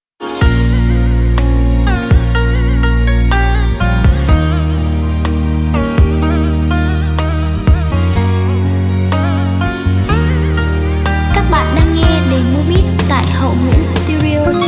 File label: beat, playback